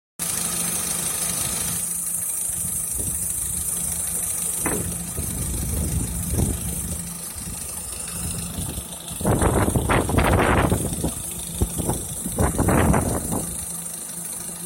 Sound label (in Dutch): tikken, corsa c 1.2 tikkend geluid